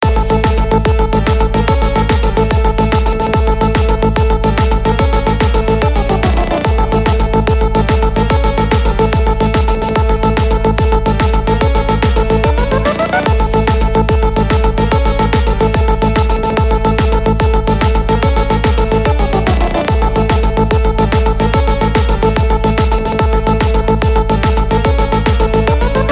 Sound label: Jewish New Tone